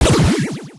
heart sound; Cupid-Heart

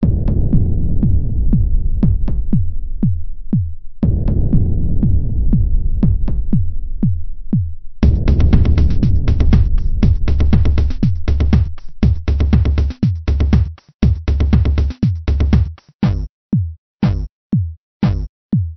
main sound; Incredible-Ninja

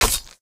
slice; fruitslasher

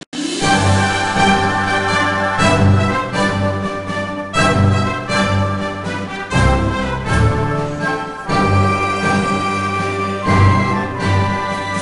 game music; cowboyrun

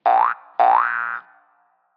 jumping sound; jumping sound in level page; Maze-Lover

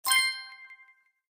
coin; cowboyrun

